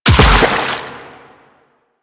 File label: Land Water, Footsteps; Warframe; Quests; The New War; Kahl